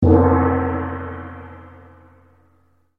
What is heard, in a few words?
gong; Csound; harmonia